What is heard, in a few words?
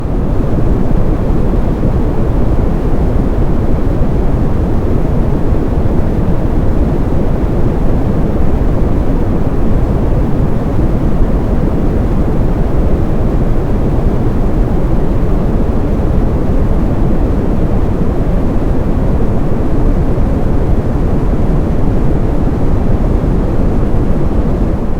pink noise